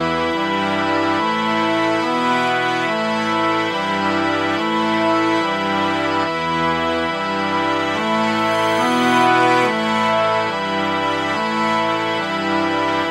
Portable Harmonium; Organux; Syntheway

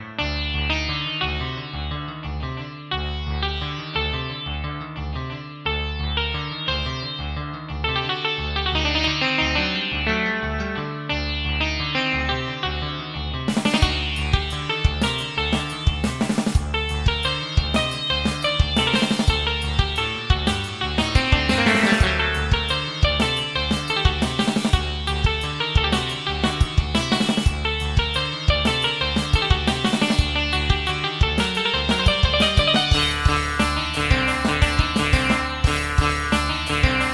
Electric Sitar; Sitargen; syntheway

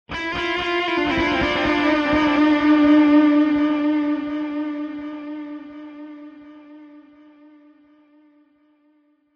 Dual Delay Cloned Delay Wet; ClonEchoes; Syntheway

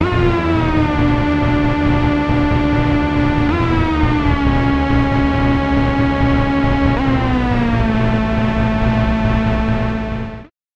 Oscillator LEAD Hoover Gritty; Alphatron; Syntheway

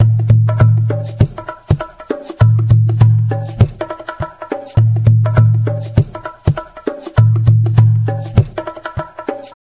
Auditorium Bata Drums Dry; Virtual Room Reveb; Syntheway

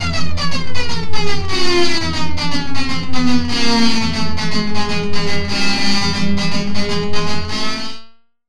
Stereo Flanger, Shepard Flangertone, Guitar, Wet; Sonicircuit; Syntheway

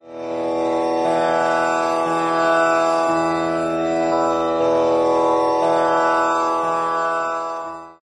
Virtual Tanpura Drone E, Virtual Sitar; Syntheway

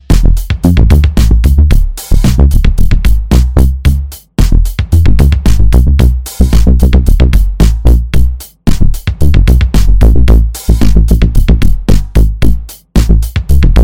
BASS Dry; SpheratorFM; syntheway

